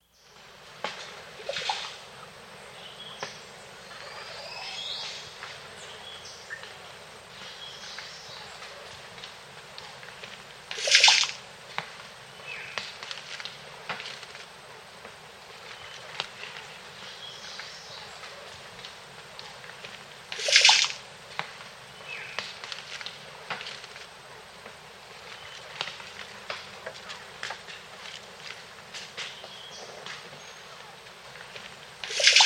Chestnut-Headed Oropendula, Nature's Songs, S. America